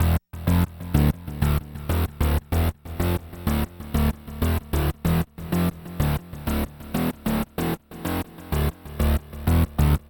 Hard HipHop Basssynth Emaj, Hip Hop Bass Synthbass loop; Digidesign; Pro Tools Essential Loops